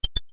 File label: se click, 8bit